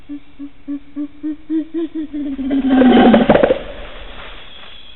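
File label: Gorilla, Dschungel; ElephantListeningProject; 50 Mikrofone im Dschungel; Artenvorkommen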